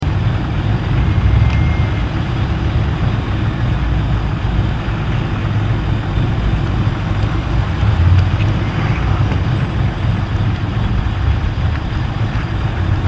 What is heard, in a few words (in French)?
Blizzard, Vents